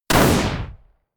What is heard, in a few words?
gun gunshot single; Was it a car backfiring or a gunshot?